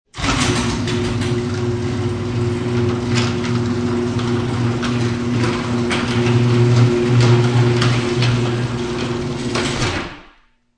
Garage Door Closing, Household, Garage Door Closing, Inside Garage Perspective